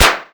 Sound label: gun